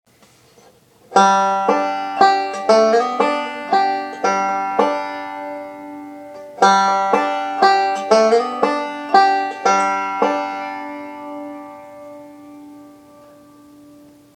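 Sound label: lick, banjo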